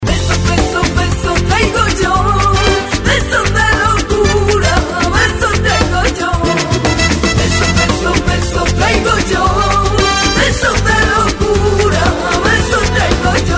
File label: la chanelita, flamenco